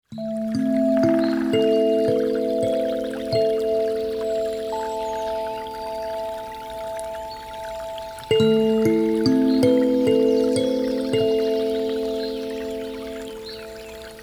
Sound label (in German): Melodie Sonnenaufgang